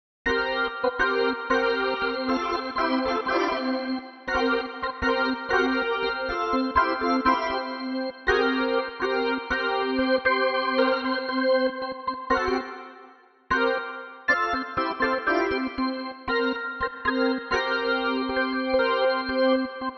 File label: Tight Funky Foot; admiralbob77